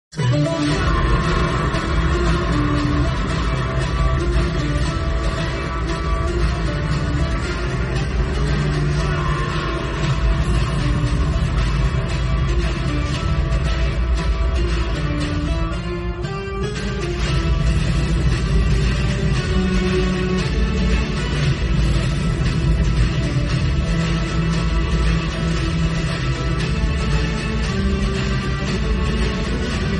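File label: background music; kgf 2; prashanth neel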